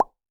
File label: Pop